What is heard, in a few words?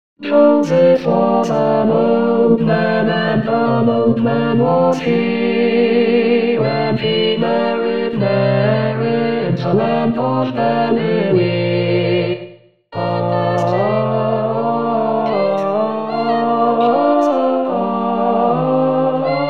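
cherry tree Tenor 2; Cherry Tree Carol; xmas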